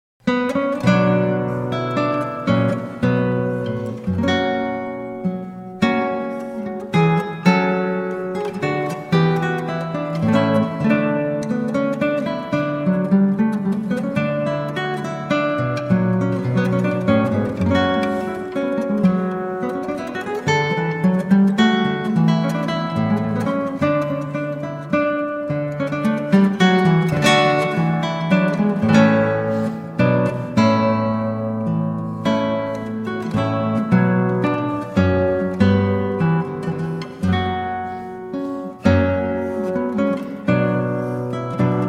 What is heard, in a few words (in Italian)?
Courante, Liuto; Francese; Intavolatura di Liuto 1593; Giovanni Antonio Terzi